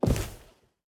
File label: cloth, step; Minecraft; Votive World